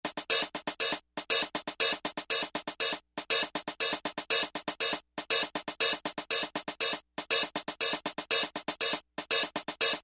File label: HiHat Part